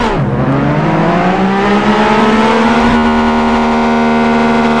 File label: 2nd gear, vehicles; enzo